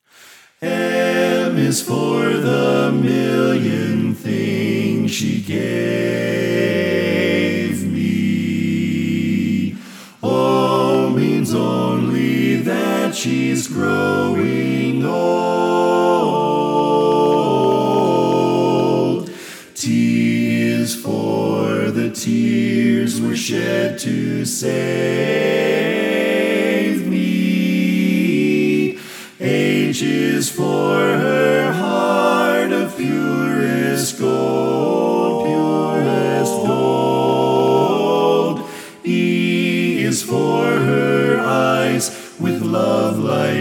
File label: Tenor Left